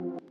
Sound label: lead